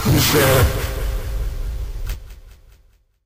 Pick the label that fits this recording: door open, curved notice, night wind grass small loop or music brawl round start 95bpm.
curved notice